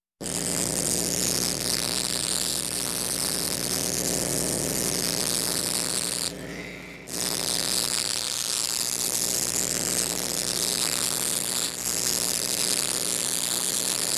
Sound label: Welding metal